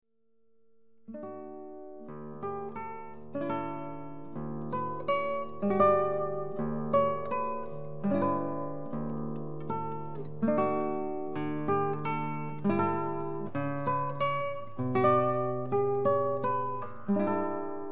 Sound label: Spanish Lull, Guitar Solos